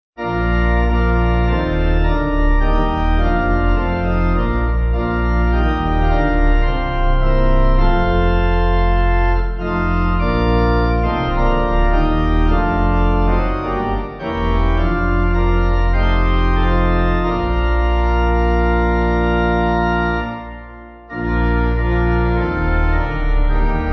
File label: Pipe